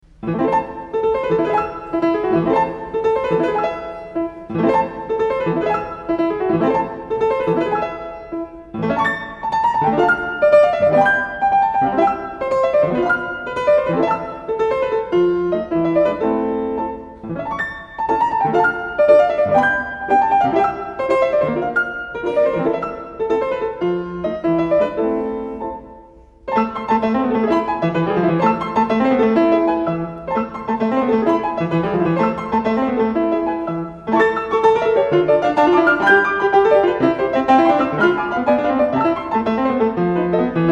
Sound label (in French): etudes pour piano; LISZT; d apres Paganini; etudes 1,2